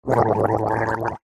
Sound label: human male gargling water; zapsplat